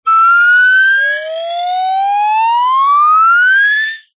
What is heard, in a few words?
cartoon slide whistle ascend climb slow